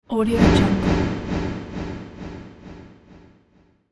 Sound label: echoes swoosh